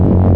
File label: reject; Alien Arena